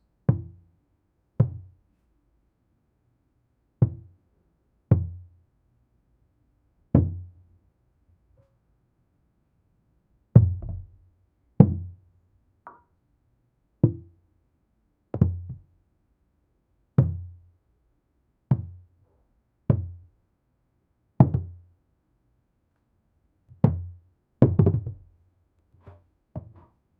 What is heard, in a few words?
tapping bumping nearby sur